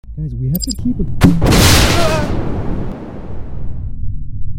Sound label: Underbarrel Grenade Launcher